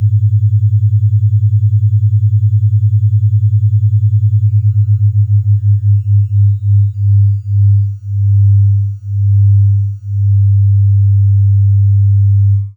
Beats

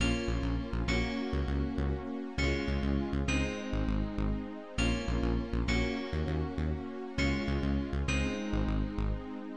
stem bass bar

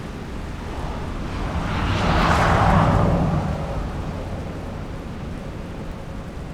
Car, Aeolian Voices

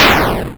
missile

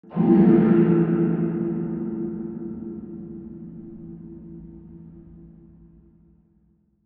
drop; EcoModelsComposition

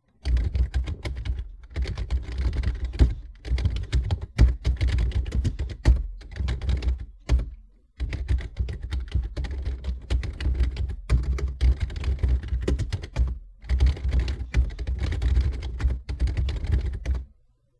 Typing

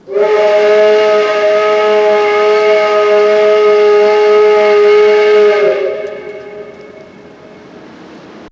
Steam; Dominion

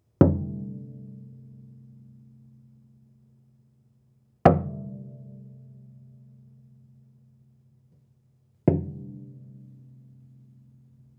Resonance Cello

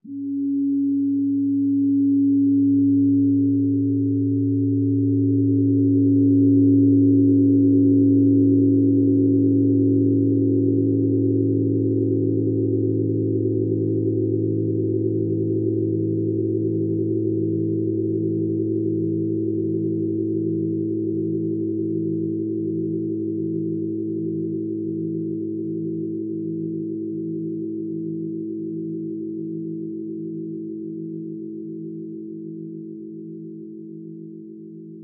Gong; Raven

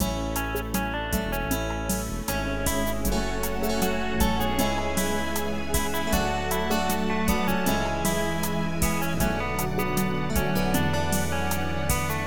stem calm drums

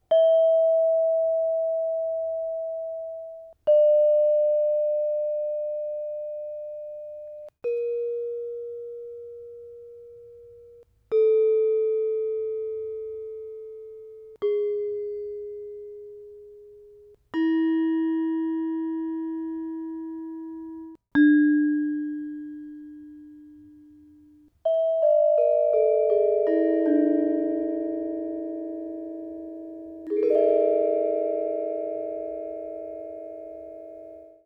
Scale Gamelan

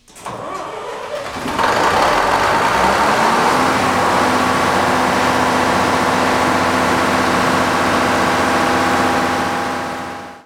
Diesel Motor

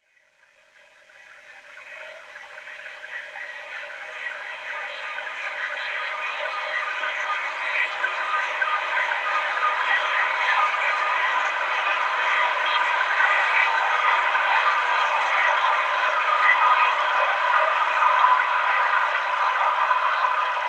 Trickle, Chalice Well

